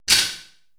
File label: Procussion